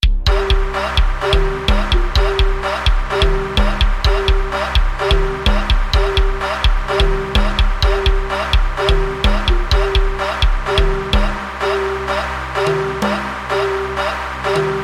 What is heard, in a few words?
Clap